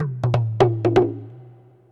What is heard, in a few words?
PERC LOOP ETHNO 125